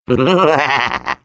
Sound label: laugh, de; Defective Turret; sp sabotage factory defect